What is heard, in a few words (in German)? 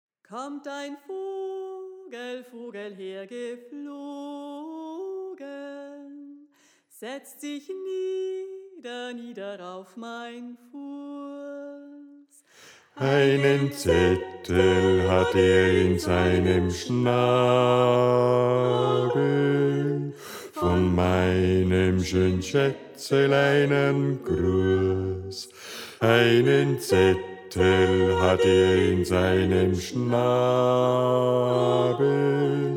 Bass; Kommt Ein Vogel Hergeflogen